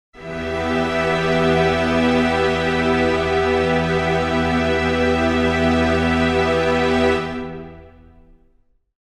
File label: string chord e minor; flaechen